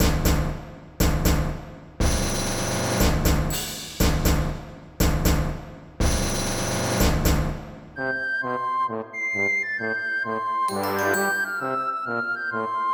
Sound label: March; Il Settimo Grande Padre 2016